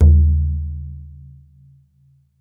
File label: BODHRAN, Celtic Percussion